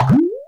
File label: Ball Appear